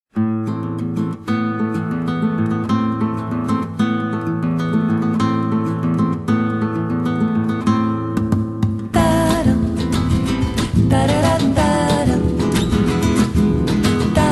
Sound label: Bossa